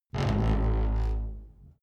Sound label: wood door creak open